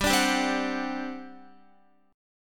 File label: G Major 7th Suspended 4th G down Guitar; GM7sus4; strummed